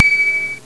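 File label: DONGER, bells whistles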